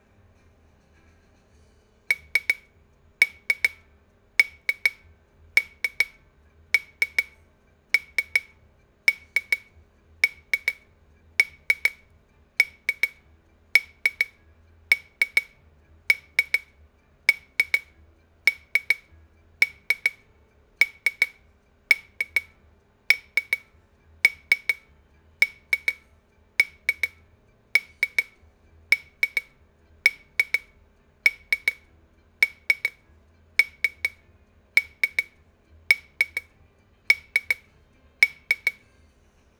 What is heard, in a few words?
twisting shaker thing